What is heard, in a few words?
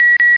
WARNING